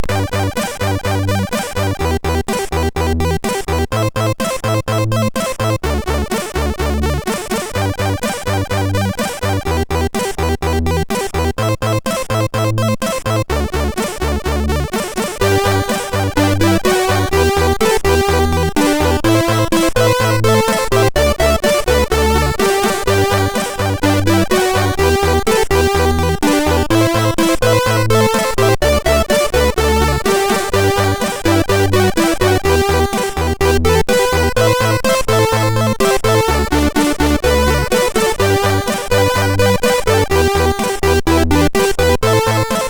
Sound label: Circular Letter tune, MOS6581R4